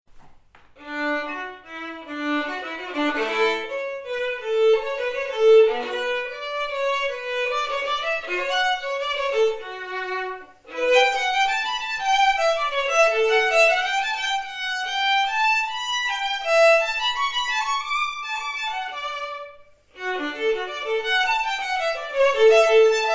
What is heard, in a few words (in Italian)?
allegro festivo solo; trauergeigerin